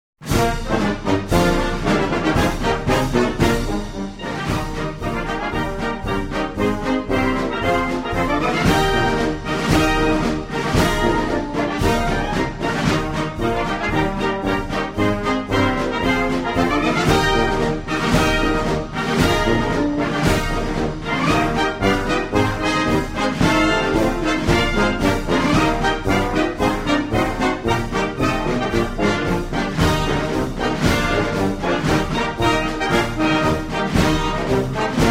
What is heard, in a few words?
dixieland musical doorbell